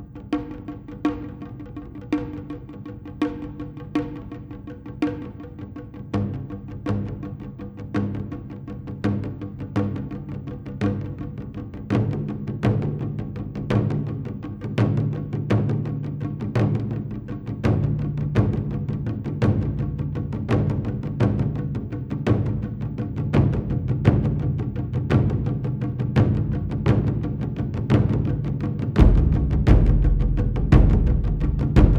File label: chinese drums; Magnitola Avtozvuk; poem; zaderzhka